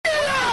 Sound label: uhgh